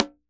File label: Drums Percussion Congas